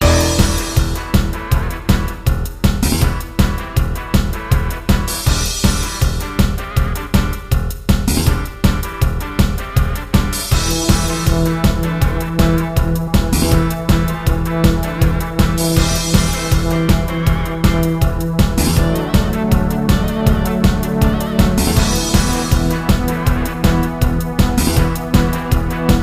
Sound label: Midi; X-COM1 UFO Enemy Unknown; GUS